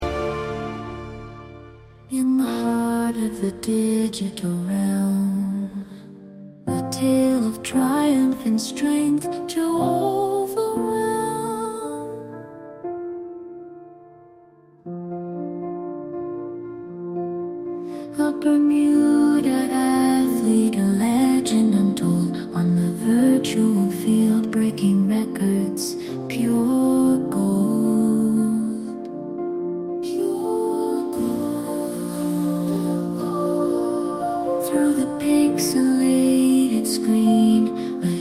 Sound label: Digital sports card music generic